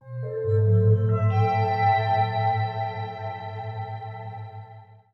TECHNO PAD 140; Keys

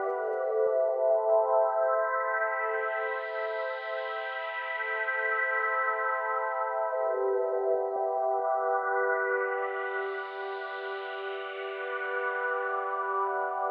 techno pad 140; Techno2; Keys

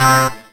dance chord

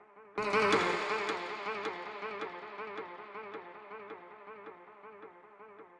ethno, 160, C

